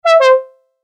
correct; 昭和のクイズ番組の正解音風。; 正解の音・ピンポーン

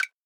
Woodblock

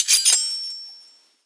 ping

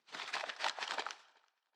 Crumpled Paper

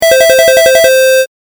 correct; ３連続の正解・ピンポンピンポンピンポンの超低音ver; 正解の音・ピンポーン

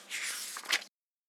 paper

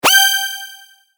sword

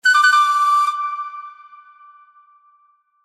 correct; 和風のピンポン×2回。その３。; 正解の音・ピンポーン

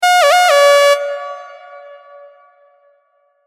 correct; うにゃっとしたピンポン。その５。; 正解の音・ピンポーン

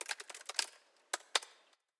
Keyboard

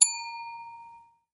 bell

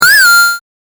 game effect

